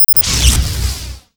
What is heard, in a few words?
Summon Pig